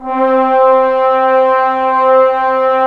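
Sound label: brass trombones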